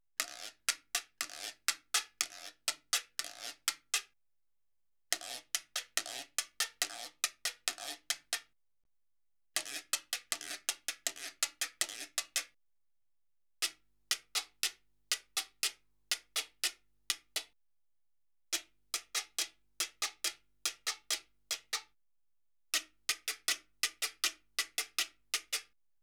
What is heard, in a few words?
Salsa Picante; Masterbits; World Zone VOL-3